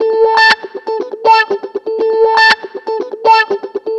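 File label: guitar, wah-wah, disco; Best Service ProSamples vol.48 - Disco Fever; AKAI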